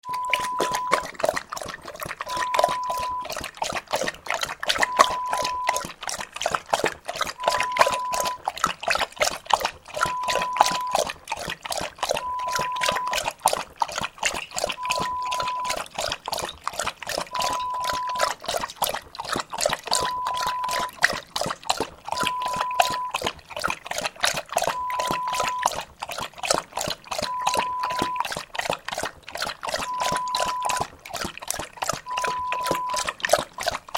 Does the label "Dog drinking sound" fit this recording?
Yes